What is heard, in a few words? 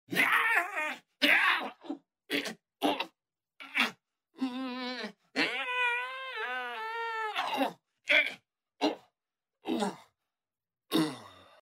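mummy crawls out of tomb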